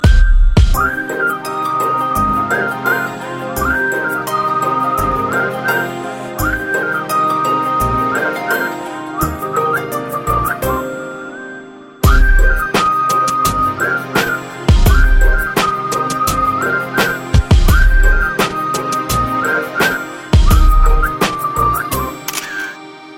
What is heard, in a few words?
Whistle